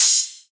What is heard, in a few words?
Open Hihat; Drum One Shots; Cymbals